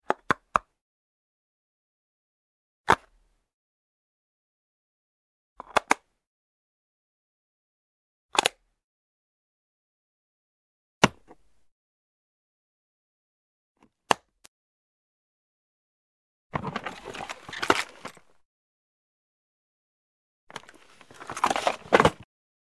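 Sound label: opening closing of a plastic case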